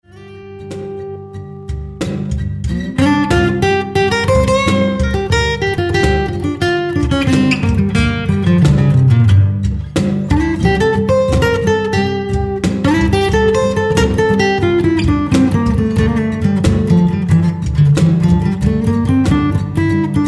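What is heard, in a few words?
Guitar Solo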